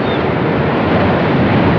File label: OCEAN